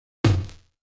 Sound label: battery midtom; Multitrack; Stem Kit